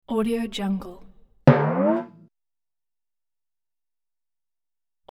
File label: timpani boing short up